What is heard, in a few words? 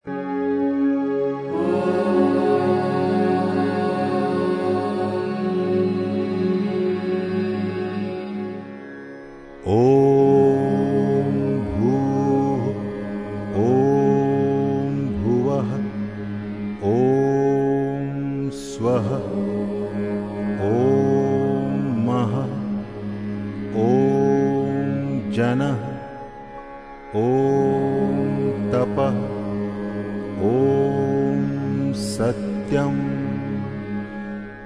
Pranayam Mantra; Pesnopeniya; India music